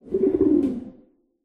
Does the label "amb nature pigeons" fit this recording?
Yes